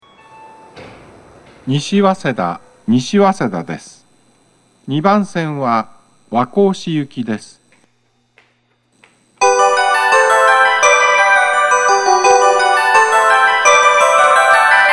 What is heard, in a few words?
nishi waseda melody